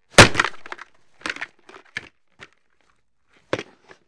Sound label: Football Helmet Pla, football